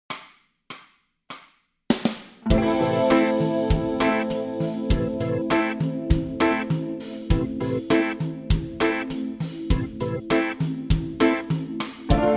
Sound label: bass scales, backing tracks